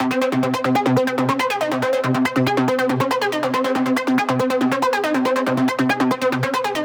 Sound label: 140BPM C; Vengeance - Essential Clubsounds Vol.4